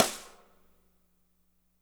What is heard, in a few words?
MEDSNR, DRY KIT; Spectrasonics; Burning Grooves